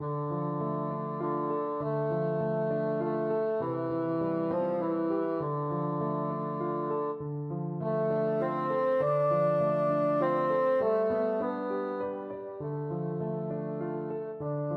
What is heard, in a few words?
scarborough fair, bassoon